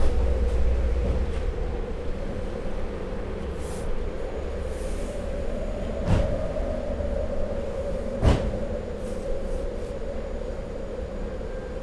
fütyülő szél csapódó ajtók

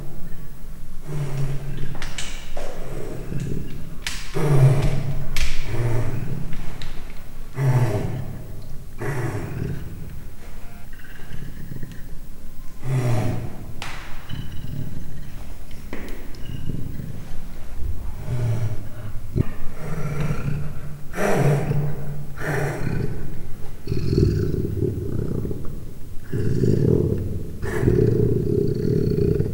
három hónapos kölyök morgása, leopárd; miskolci zoo

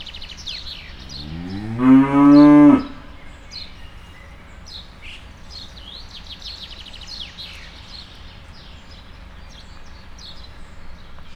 egyet bőg, magyar tarka szarvasmarha; miskolc zoo

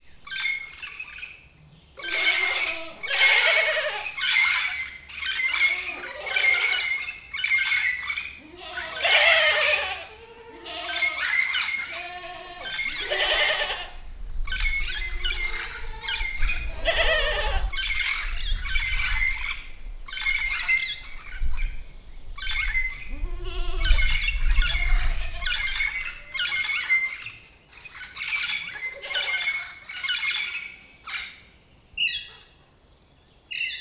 házikecskék papagájok; pecsi zoo 2017

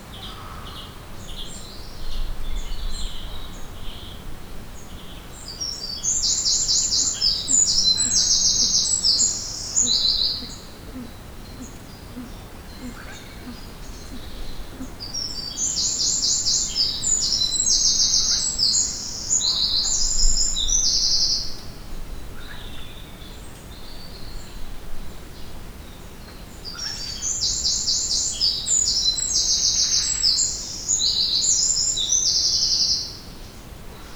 okorszem jobbra a kedves hangocska a szajko tolvalo; allathangok; termeszetben; perkupa

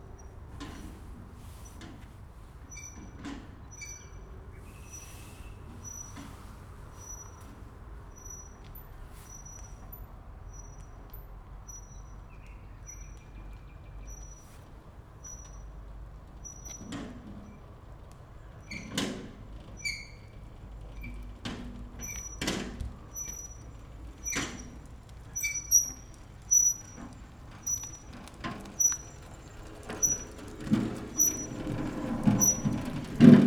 jobbról balra, fel úton a mikrofon, talicska

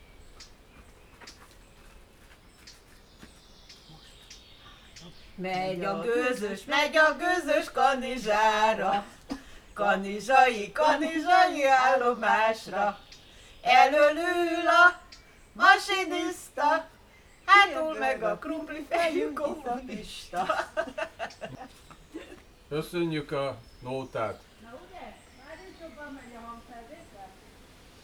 hangfelvetel keszites megzavarasa celjabol, notazas; vizhaz; cardioid

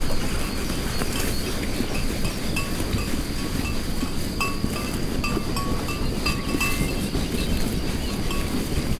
legelő nyáj kolomp; állathangok; paraszt udvar; juh

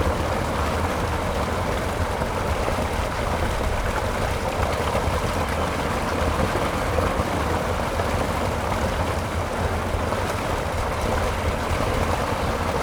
kisvasut menti vizes arok leeresztese, zsilip, hortobagyi halastavak

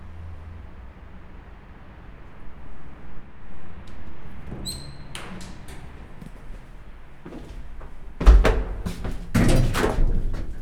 onmukodo ajtaju fel, lift; angyalfold

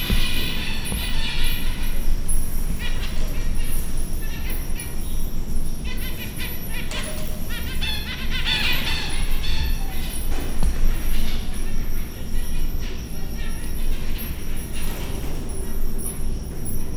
zajok, zebrapinty; Győri Zoo 2014

